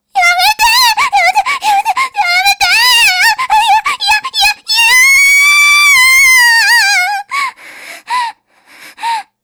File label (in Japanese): 絶頂, 凌辱系 音声素材; Honey Drop